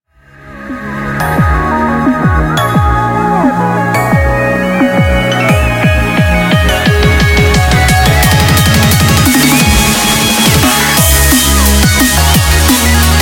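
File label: background music crossfade; Invaxion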